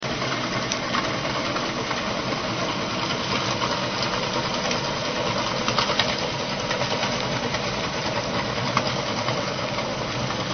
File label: ship moderate